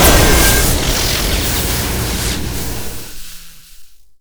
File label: turret die; hl2dm